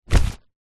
bag teachers fabric containing books and paperwork set down on ground; foley